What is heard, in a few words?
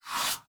SFX soul sucking; Infernal Radiation